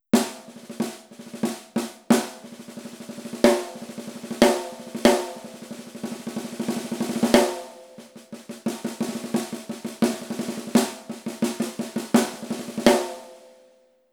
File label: bop snare